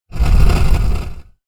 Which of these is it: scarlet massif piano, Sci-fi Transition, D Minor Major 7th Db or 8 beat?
Sci-fi Transition